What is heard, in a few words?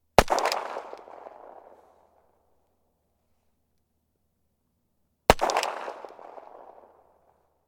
gun 1911; weapon; still north media